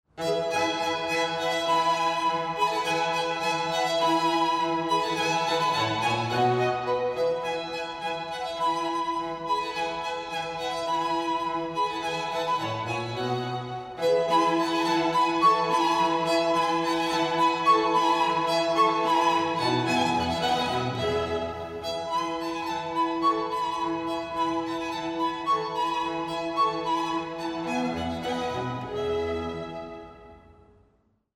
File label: STRINGS